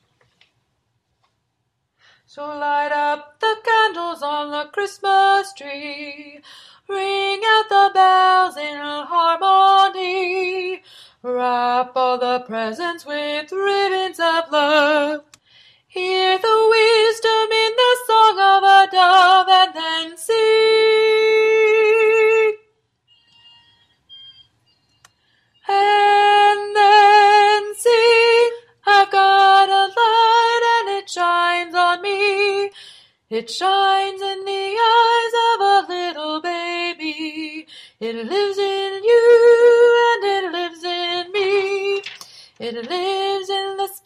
Spirit of the Christmas Tree Bass

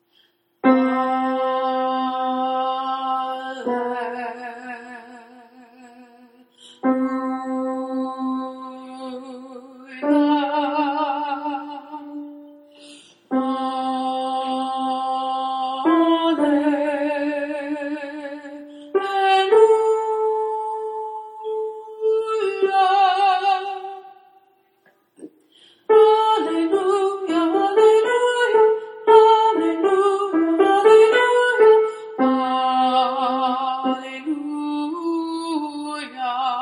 Alleluia in 6 4 Alto

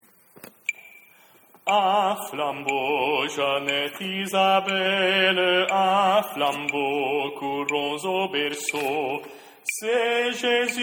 un flambeau part

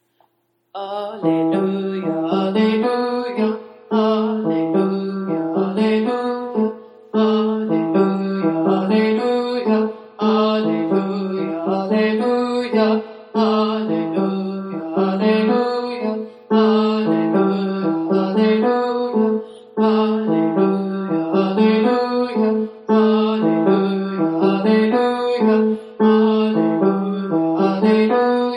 Alleluia in 6/4 Tenor

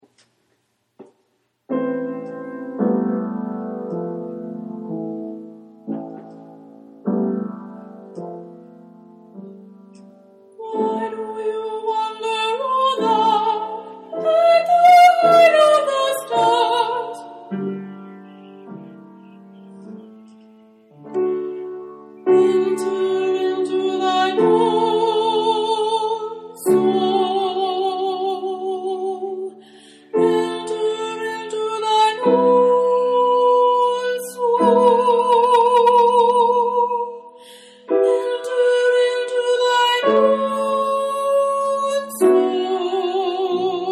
Why Do You Wonder Soprano

